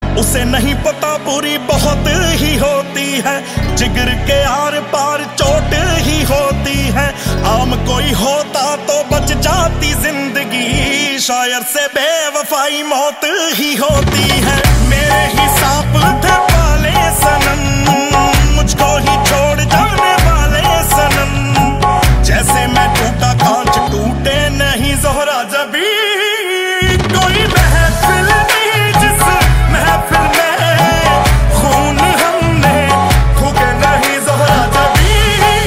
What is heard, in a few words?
hindi song ringtone